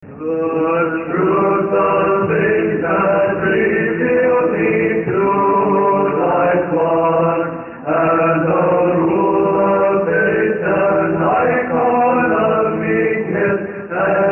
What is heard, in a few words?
Dismissal Hymn Choir; St. Nicholas Choir; Banquet